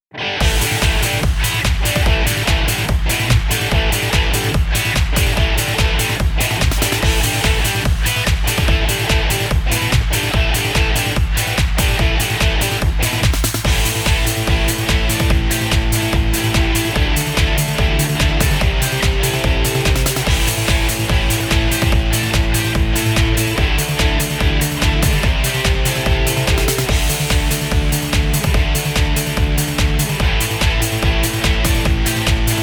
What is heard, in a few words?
Beat